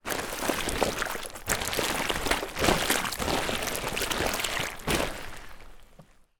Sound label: foley swimming pool plastic cover movement